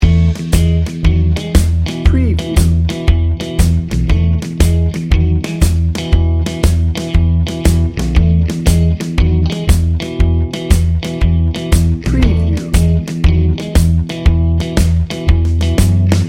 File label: bouncy loop